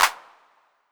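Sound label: 808 Clap; Roland MV8800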